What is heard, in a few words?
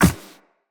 Walk Ice, Footsteps; Operator